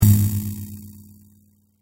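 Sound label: tom and cymbal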